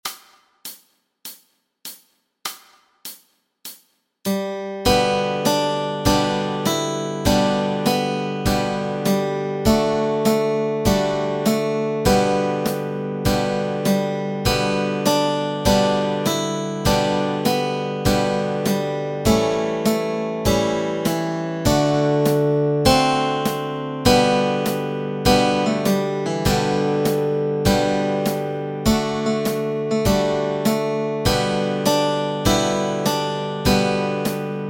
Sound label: Melody and Chords, Easy Guitar and Ukulele